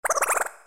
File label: bombomb